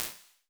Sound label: closed hats 128; Cymbals; Minimal House; Vengeance Minimal House Vol.1